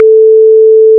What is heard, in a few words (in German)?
3 ton rechteck